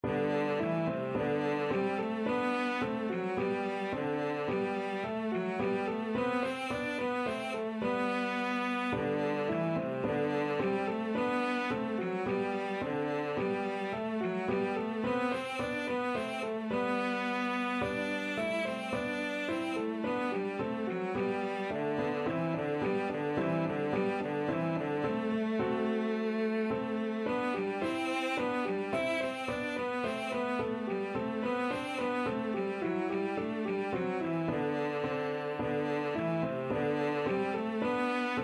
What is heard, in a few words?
bach musette, cello